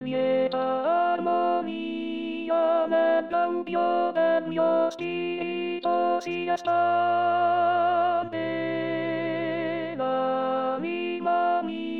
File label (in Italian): Contralti; Lieta Armonia